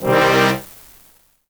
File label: Horn Stab